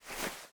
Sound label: clothes generic foley